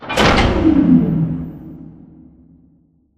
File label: Robot Arm Track Stop Mid; Boomtown; Titanfall 2